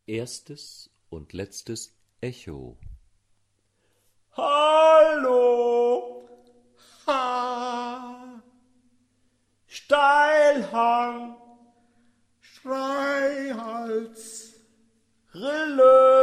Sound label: Echo